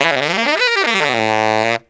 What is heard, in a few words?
SAX LICK, BRASS LICKS; Ueberschall; Jam Box